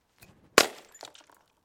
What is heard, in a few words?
smashing bottle